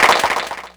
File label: Clapbox Pitch; Ableton Foundation FreePacks